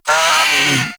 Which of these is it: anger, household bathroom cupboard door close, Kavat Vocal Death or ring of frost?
Kavat Vocal Death